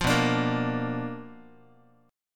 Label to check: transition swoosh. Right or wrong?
Wrong